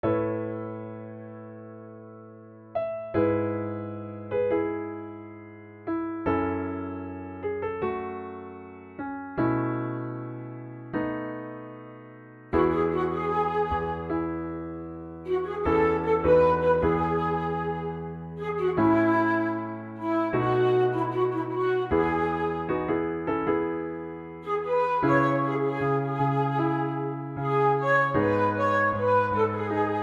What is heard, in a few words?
Flute and Piano